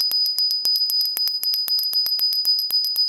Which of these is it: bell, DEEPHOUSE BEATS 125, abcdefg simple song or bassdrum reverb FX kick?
bell